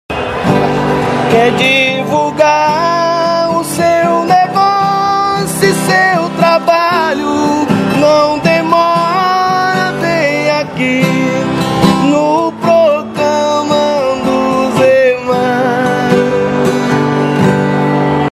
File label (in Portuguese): vinheta cantada